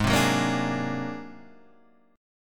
Ab 9th Sharp 11th Ab; Ab9#11 strummed

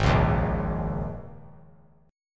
Ab Suspended 2nd Suspended 4th Ab down; strummed; JGuitar

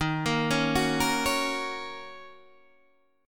D sharp Minor 7th, Guitar, DADGAD

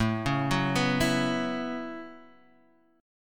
A 6th Add 9th, Guitar, Half Step Down

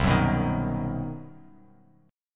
C Minor Major 7th Flat 5th chord; strummed; JGuitar

